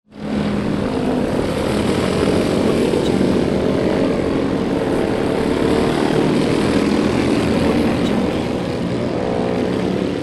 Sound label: motorcycles passing by